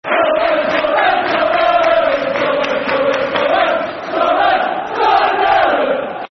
Sunderland, Sunderland, Football Chant (Soccer Song); Sunderland Safc